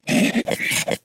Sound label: snork make hit; mutants; STALKER; customnpcs